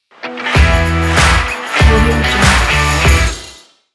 stylish guitar logo

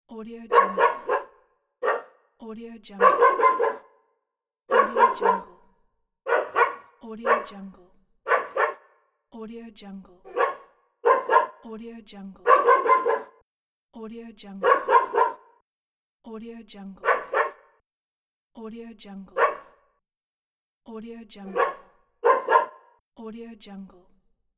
barking dog

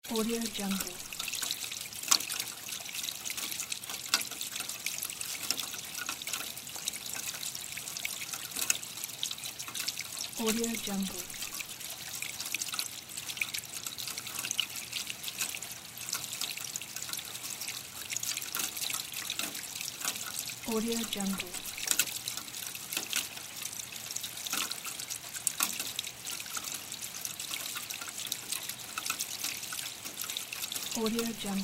rainwater trickling from downspout; loblollyfx

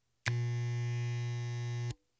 electric shaver short on off switch click

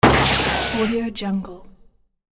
comedy strike

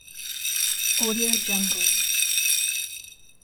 stirring crushed ice inside glass

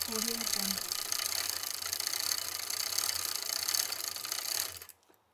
bicycle gears

